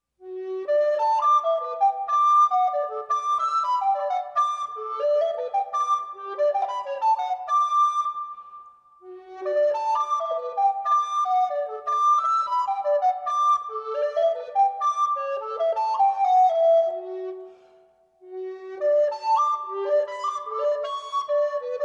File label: heights alto recorder version